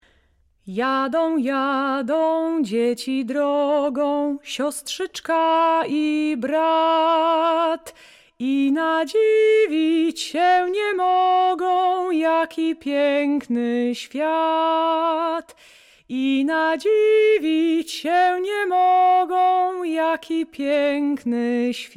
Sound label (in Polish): jada jada dzieci